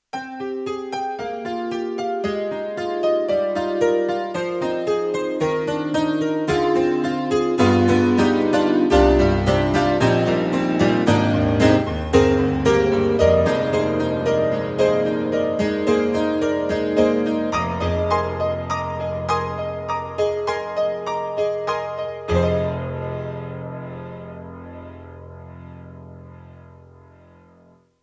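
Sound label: YAMAHA MU1000EX, Detuned Bright Piano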